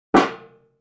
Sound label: Aluminum Pan Buckle; barrels buckets & cans